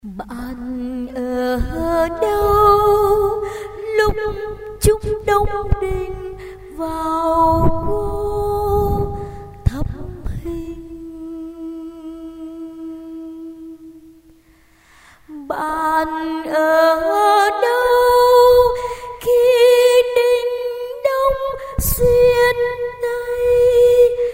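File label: soprano tenor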